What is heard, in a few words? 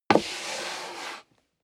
step leather shoes wood creak slide long; schritte